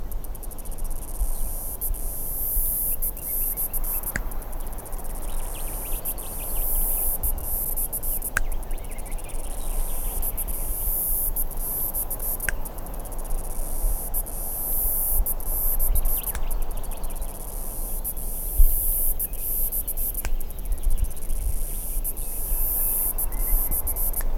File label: swinging tigris; BioAcoustica